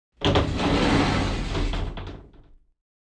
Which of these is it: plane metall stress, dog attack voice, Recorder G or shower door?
shower door